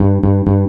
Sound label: Three Tone